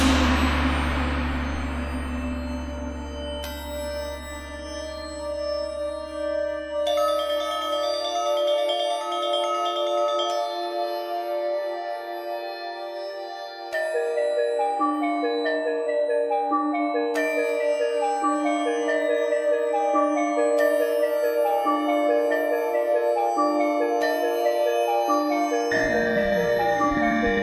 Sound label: Gamelan techno Slow; rive wreck hack die retry; ps4